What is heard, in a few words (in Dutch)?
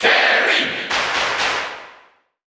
Cheer Dutch; Terry; SSBU